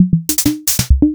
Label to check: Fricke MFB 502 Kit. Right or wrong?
Right